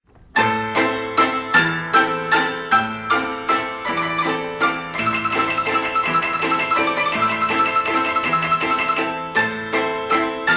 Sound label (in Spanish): los pianos mecanicos